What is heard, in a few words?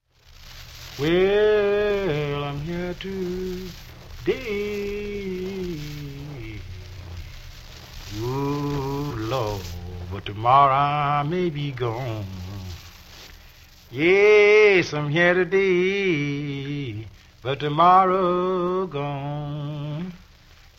moan